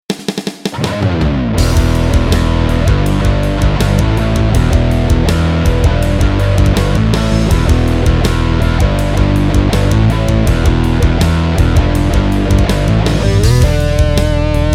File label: patch demo